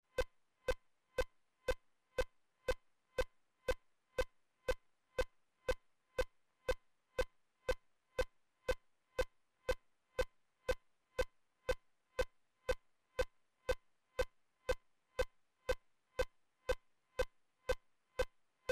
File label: codar 2hz